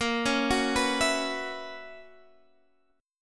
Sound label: B Major 7th Suspended 2nd Suspended 4th A sharp, chord shape